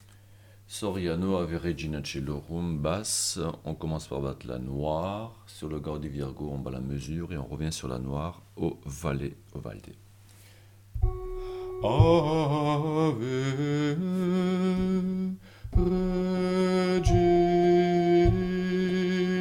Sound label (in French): Basse; Soriano; Ave Regina